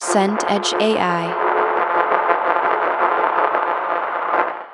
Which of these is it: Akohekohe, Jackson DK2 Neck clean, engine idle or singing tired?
engine idle